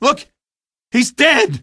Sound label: death; freak fortress 2; gordon